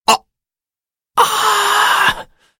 stabbed; freak fortress 2; library of ruina; roland

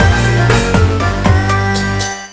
game over; tf2ware ultimate; music game; switch moveit cricket

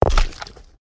walk, footsteps

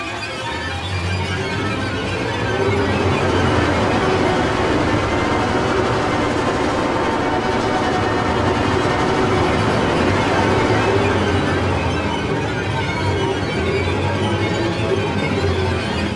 granny chase bgm; zerosiki; slender

